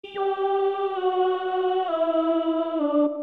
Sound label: sopranes; Hlonolofatsa